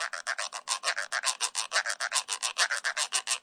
140 scritching my comb teeth, drum loops and breaks